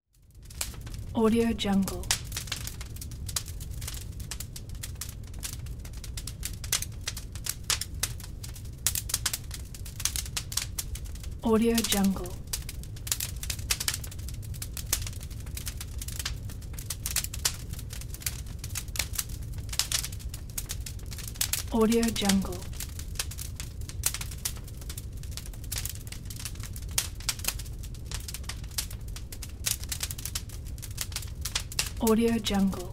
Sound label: fireplace